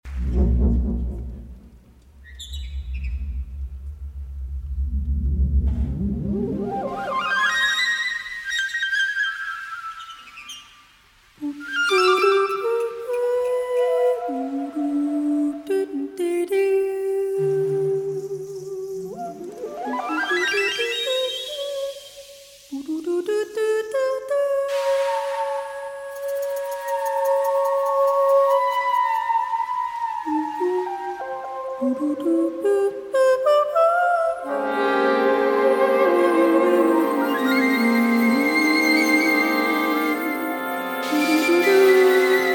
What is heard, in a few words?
Big Band